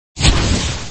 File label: skill start; Endless World Idle RPG